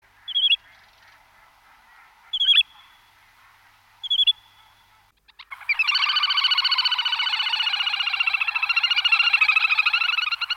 Zampullin chico

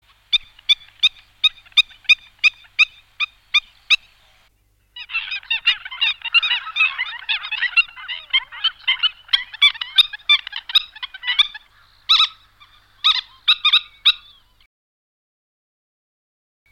Ciguenuela, pajaros

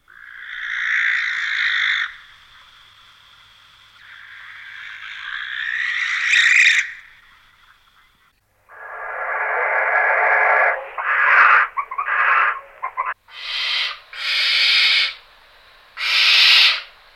Lechuza comun

